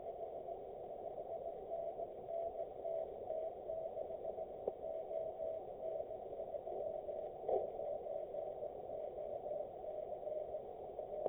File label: 1296MHz 767km; F6KFH